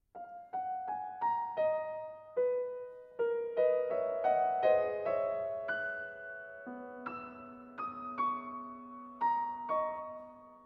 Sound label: Fantasia in B Major For Piano